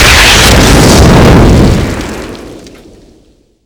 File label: train explosion round combo; WAR